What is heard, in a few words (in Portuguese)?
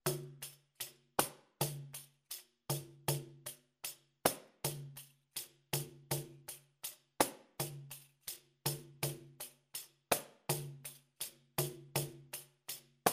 samba marcacao slaps slow, pandeiro